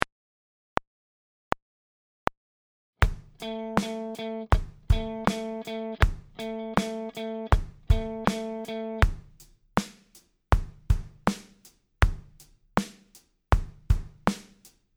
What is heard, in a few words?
groove